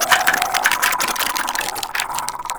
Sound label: Web Charge; Warframe; Infested; Ancient Shell Agent